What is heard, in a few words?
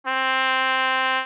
trumpet